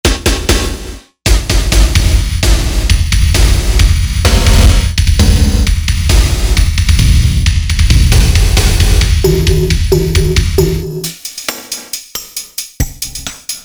Insane Drum Layer, synth

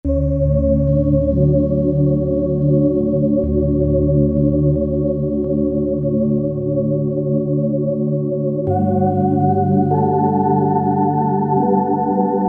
Quiet Time, Korg MSC-10S Ethnic

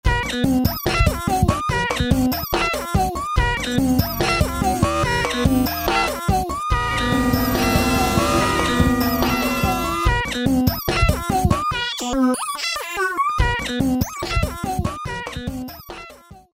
DanseSacrale, Roland V-Synth

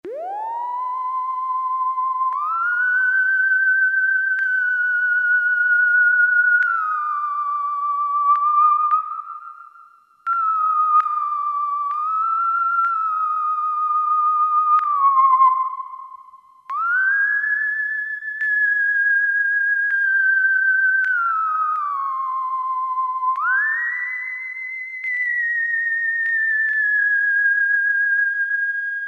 Piccolo portamento, Farfisa Syntorchestra

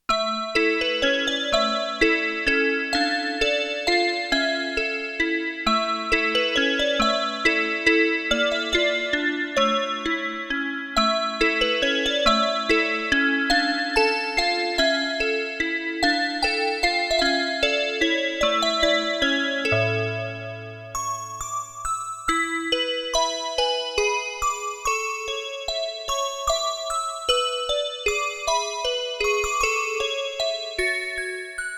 Music Box, Roland JX-8P

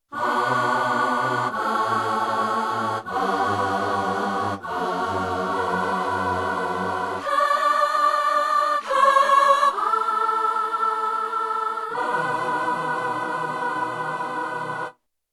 Ahh Chorus; Yamaha TX16W

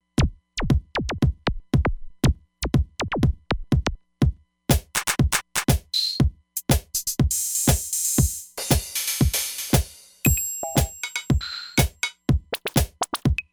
Machine Kit 1, Roland Fantom XR; Drums

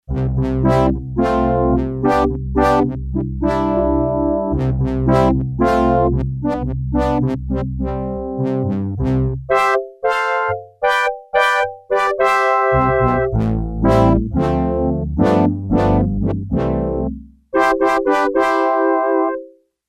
Elec Brass, Yamaha TX802; Factory Patches; Voices

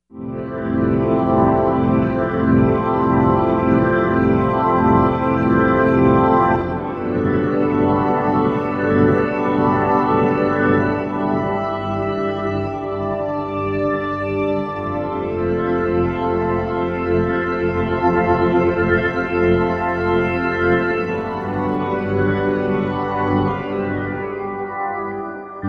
Glass Pad, Roland V-Synth; Factory Patches

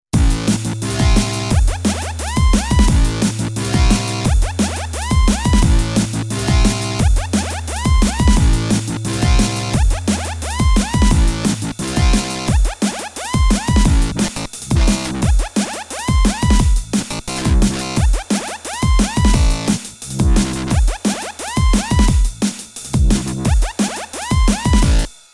DRUMSTEP

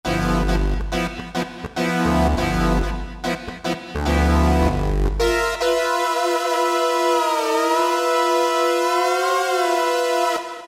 Super, Yamaha TG33

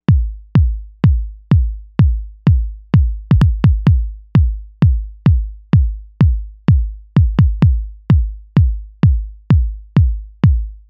Kicker BD; Novation UltraNova; Factory Patches

